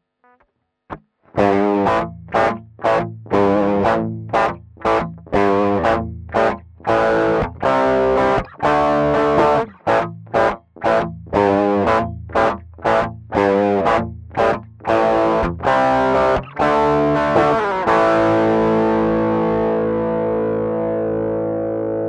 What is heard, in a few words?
ibanez ts9dx